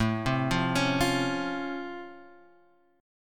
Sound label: C sharp Augmented Major 7th A, chord